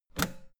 old button harsh push hard

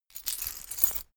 key ring large pocket put

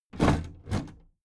big plastic case lid put down regular

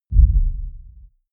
sub impact short distant rumble

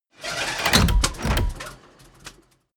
tank small damaged

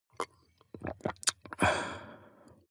drink glass milk slurp small

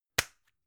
gum plastic box close hard

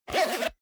zipper small case close fast

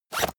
zipper use fast

